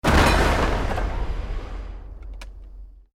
EXPLOSION MEDIUM WOOD; Created explosion effects, house, building or vehicle effects; Bombs; Bomb Sound Effects

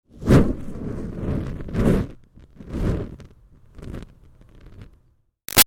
Fire Torch Whoosh Fast Slow Moves; Strong; Flames; Objects

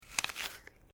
Male Eating Apple Bite; Male Vocal sounds; Human

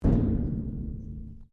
Strike Metal Hollow Tank Soft; Materials

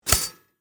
Toaster Up, Household, Toaster, Up; Appliances

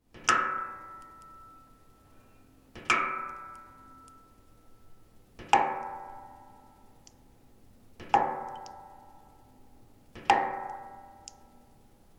Drips metallic various dripping; Dripping Sound Effects

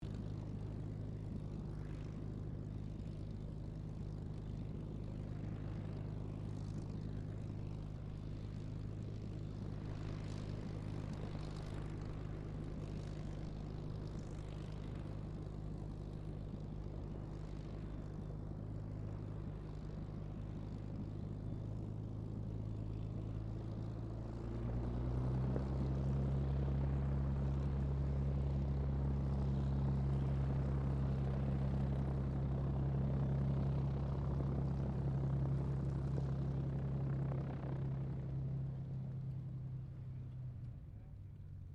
AIRCRAFT P-40F KITTYHAWK TAXI DISTANT; Warhawk/Tomahawk; Airplane; Vehicles; 1942

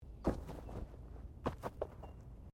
Body movement Foot Foley

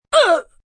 Grunt Kid Winded, Grunt, groan, impact, male; Groaning

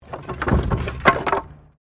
Wood Move

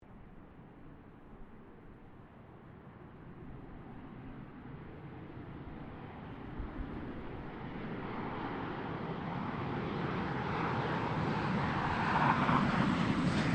AIRCRAFT CA-27 SABRE PASS; F-86 Sabre; Airplanes; Vehicles

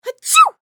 Human Female Sneeze; Sneezing Sound Effects; Sneezing (Sound Effects:Human); SFX Bible 6

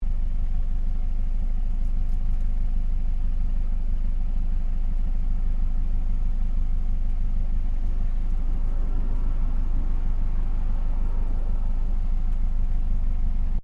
Car Ford Focus Idle Exhaust Loop; Ford Focus hire car; Cars; Vehicles

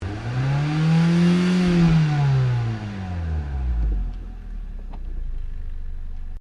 Rev Exhaust, car; Hyundai Accent 2008; Hyundai hire car; Vehicles

